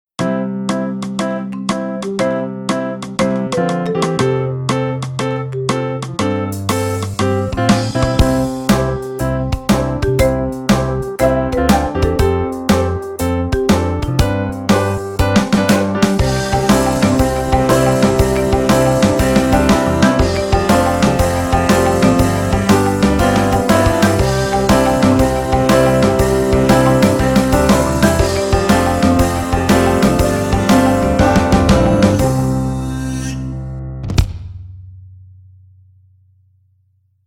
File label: kids, Mini Movie music; Asus